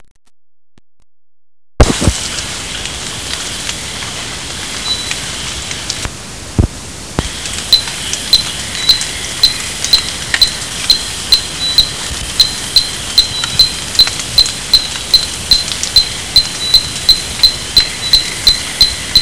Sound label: Platypelis tetra